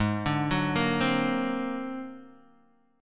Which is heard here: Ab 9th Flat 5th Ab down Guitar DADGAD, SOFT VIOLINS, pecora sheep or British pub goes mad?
Ab 9th Flat 5th Ab down Guitar DADGAD